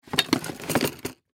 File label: Toolbox Rummage Through Tool Plastic; Hand Tool; Hand Tools; Industry; Blastwave